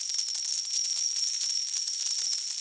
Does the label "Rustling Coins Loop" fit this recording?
Yes